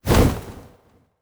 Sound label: wing